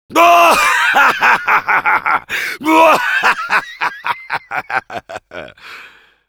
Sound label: laugh long